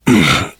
shoved

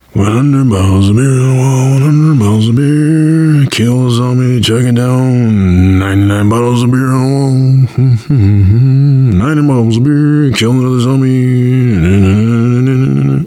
nervous humming, survivor voice, biker

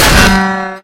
melee guitar; weapons

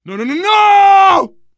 grabbed by smoker

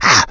been shot, infected; npc, action; Left 4 Dead 2

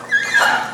door squeek, doors

